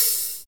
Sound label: HH, Real